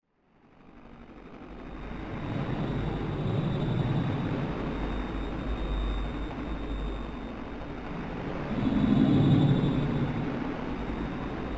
Intro Scene Ambience; Even the Ocean

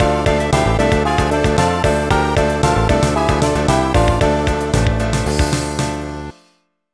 Accus Incorrect; clue mouse trap perfection aggravation 2007 nds gamerip

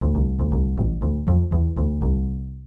Bassriff

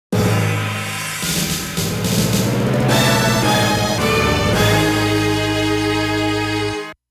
Gravestone (Game Over); Metal Slug

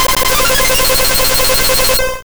Aurora Beam; sfx; attack moves; pokemon; gen 2; gsc

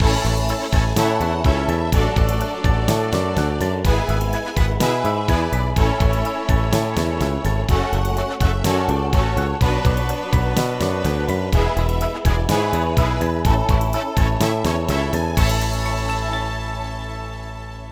Game Complete Jingle; Garfield Gets Real; NDS

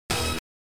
pickup tone

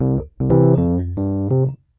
Elec Piano Loop

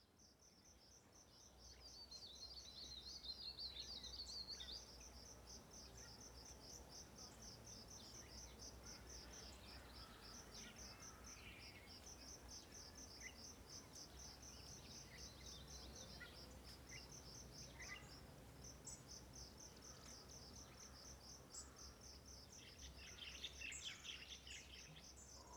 amb generic outside day; Lucius III; pc linux

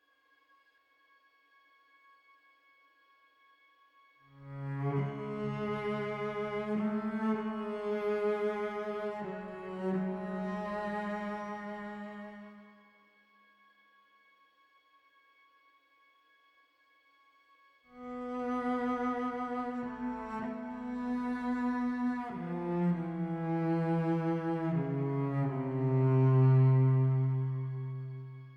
Stinger Long Stark; Pillars of Eternity; Stinger Thaos Long Stark

